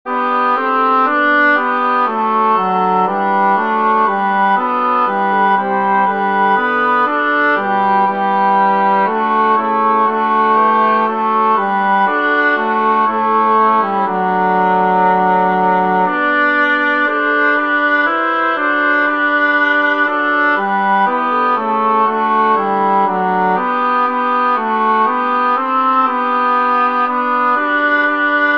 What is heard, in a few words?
(tenor); Peuple fidèle; Concert du 13 décembre 2024 en l'Église St Pierre - St Paul